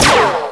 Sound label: PLASMA